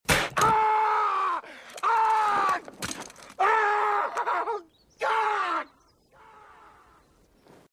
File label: Stapler; Chris Farley